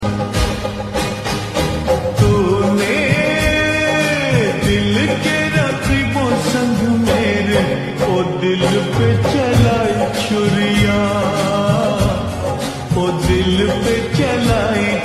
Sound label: Ringtone